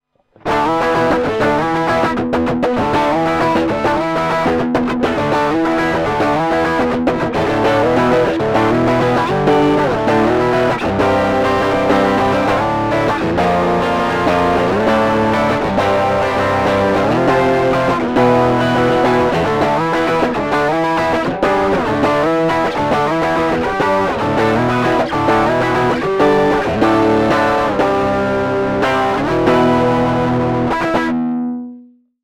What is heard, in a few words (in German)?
fame tube steamer volles drive